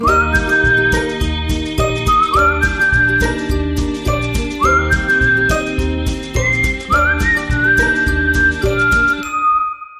Intro Whistling

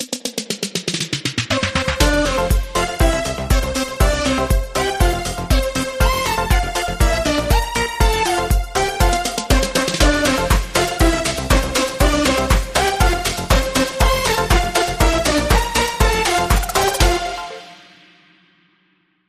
Techno Happy Birthday

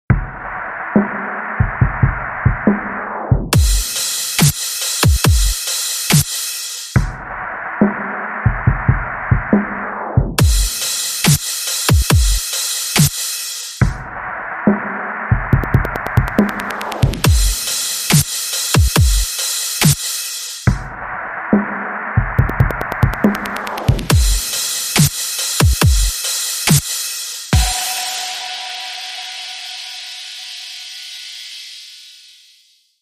Beat

